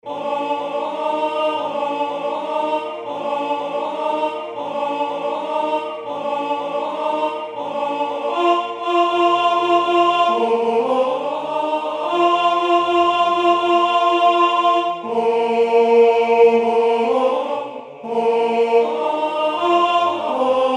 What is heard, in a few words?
alto; Partons la mer est belle